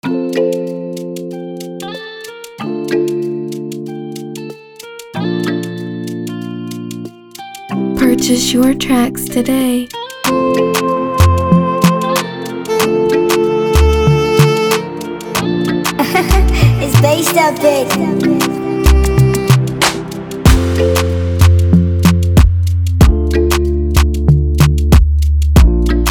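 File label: 94bpm F major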